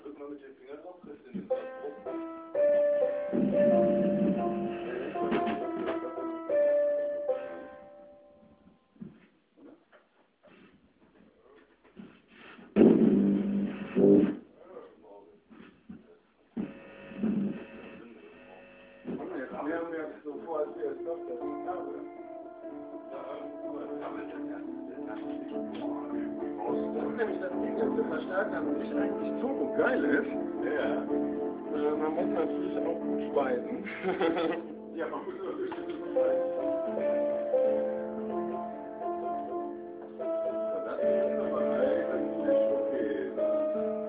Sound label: piano